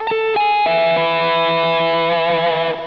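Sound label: tapped, Guitar Harmonics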